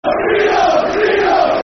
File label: Football Chants (Soccer Songs); Hull City Hcfc